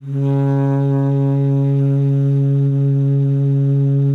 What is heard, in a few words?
C#3, ALTOSAX; Xsample VOL-2